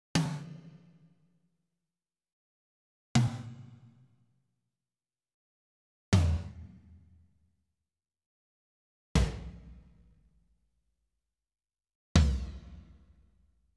Tom 1-5 stick; Sound Sampling Collection VOL-5; Drums & Bass Fusion Funk Effect

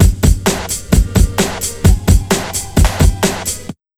HARD 130, Big Beat Drumloops

